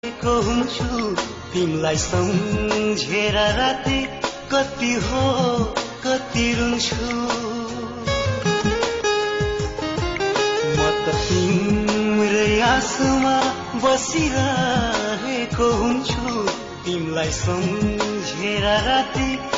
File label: nepali ringtone